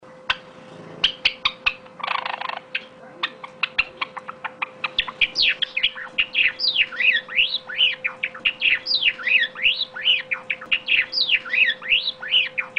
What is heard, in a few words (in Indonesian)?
suara tembakan gelatik jawa